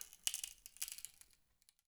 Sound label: Palette Tear, Wooden Palette